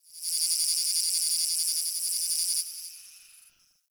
Cricket; Warframe; Tau

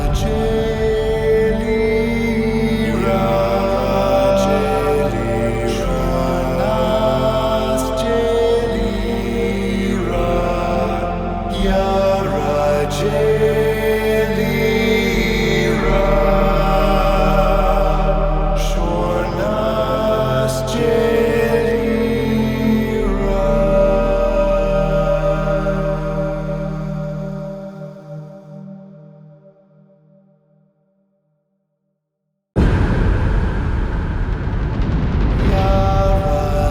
Climax Cue C; Entrati; TennoCon 2023; Cinematics

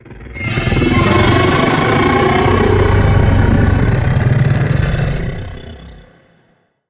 Arachnoid Camper Vocal Attack; Warframe; Corpus

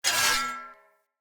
Melee Whoosh Accent; Weapons; Swords; Dendrit Short Sword; Accents; Vetch

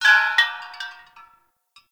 Bell Swing Long; Enemy; Corrupted Sproutfolk; Foley

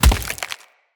Wood Flesh Impact; Weapon Impacts

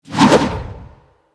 Melee Charge Swing Whoosh; Weapons

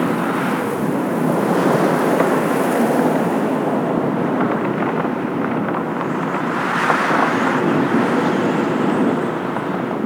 Ice Exterior Amb; Warframe; Corpus

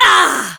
Punch, Barks; Kuva Lich; Persona B; Ability

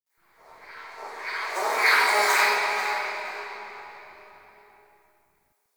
Sentient Lair Spirit Chatter Two B; Warframe

